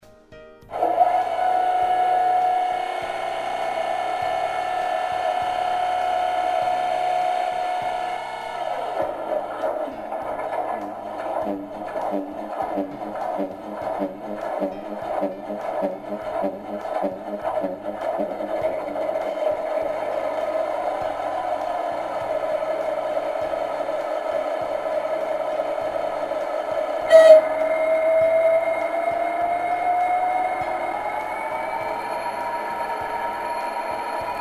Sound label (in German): taiga trommel; M62 Taigatrommel Diesellok; M62 Taigatrommel Diesel Loco